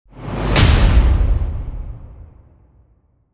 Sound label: Whoosh Bang Stampede